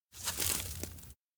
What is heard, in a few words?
grass pull; rikka; anemoi パッケージ版 1.1